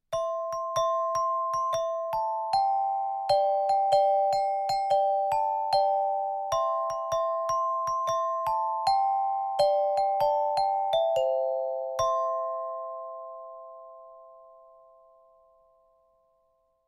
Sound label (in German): AG 500 - mit zwei Schlägeln; studio-49